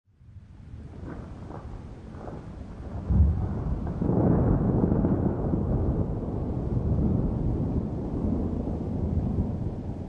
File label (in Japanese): kaminari